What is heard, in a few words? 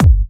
Hard Kicks; Vengeance Samples